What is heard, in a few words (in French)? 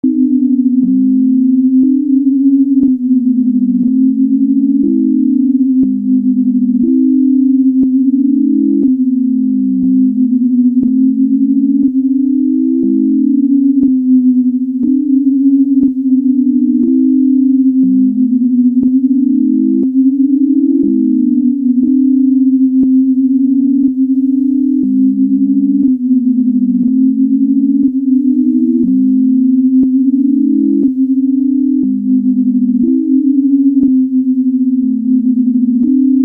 exemple mix 7 symb 2 voix 1 sec & 3 symb 1 voix 1 sec en sinus